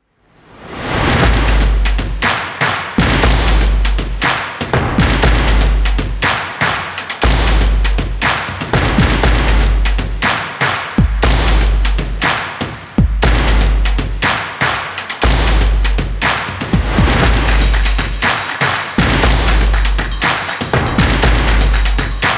Background Stomp Percussion; energetic

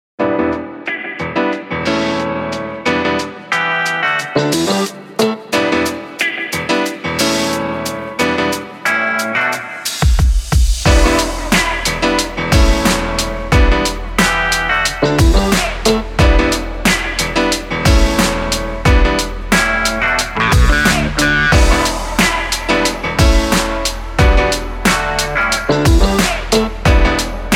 Hip Hop, relaxing lo-fi

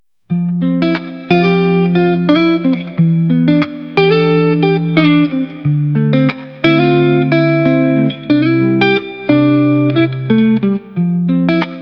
Lofi Hip Hop; relaxing lo-fi

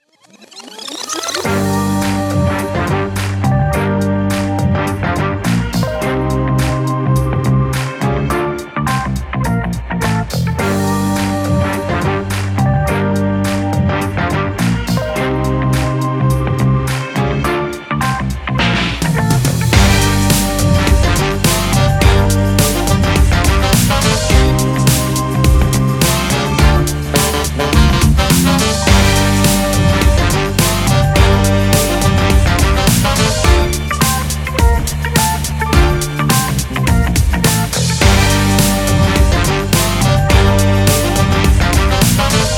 Hip Hop Funk, relaxing lo-fi

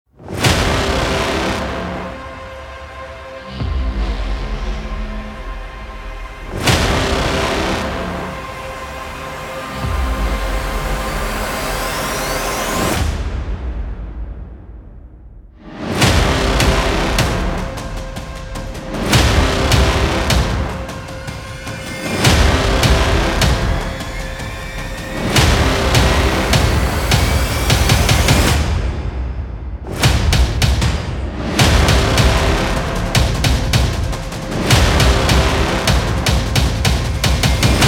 Action Cinematic Intro, intense trailer themes

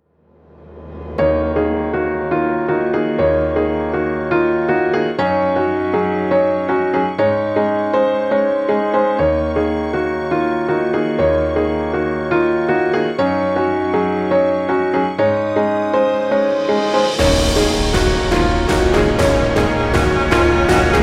Cinematic Heroic; epic background music

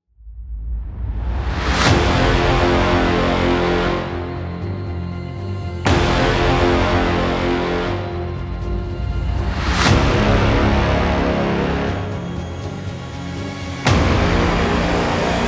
Heroic Music; epic background music

